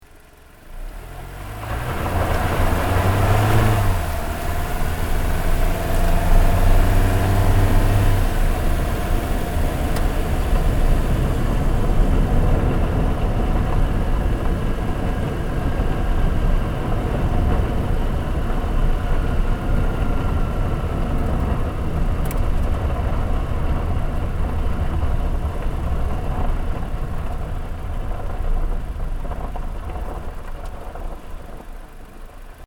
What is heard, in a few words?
CAR TOYOTA COROLLA ACCEL ENGINE BAY GRAVEL LOOP STEREO, hatchback; 2009 Toyota Corolla hatchback; Cars